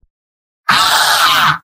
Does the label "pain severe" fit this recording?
Yes